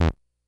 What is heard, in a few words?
Bleep, Korg DS-10